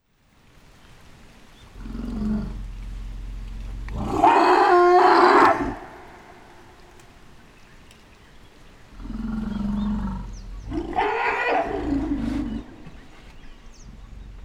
Elephant Confrontation

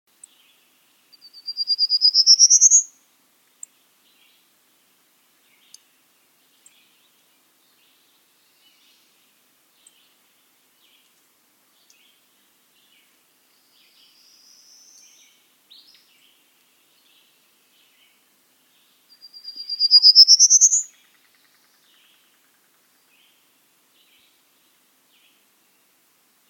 prairie warbler

